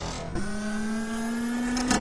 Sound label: power Bar; fraction feed the frog